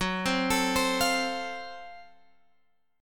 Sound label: Gb Minor Major 7th Flat 5th, Guitar, Open G, chord